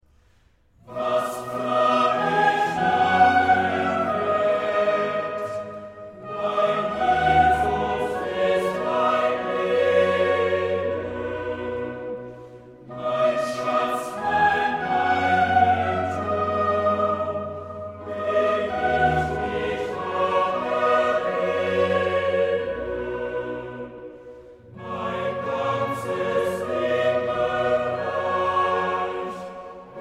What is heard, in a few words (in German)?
choral; Collegium Vocale; Kantaten